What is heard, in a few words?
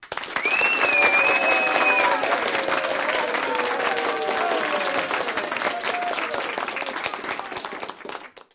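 Applause Cheering Whistle, large; medium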